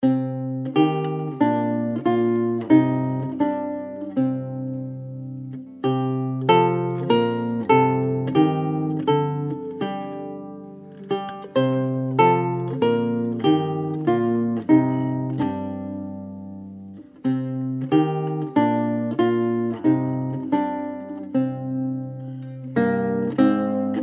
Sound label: lute solo